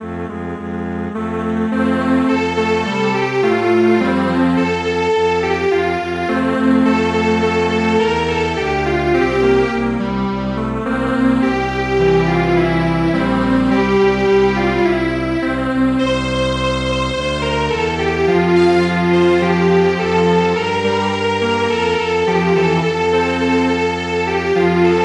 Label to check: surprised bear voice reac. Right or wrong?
Wrong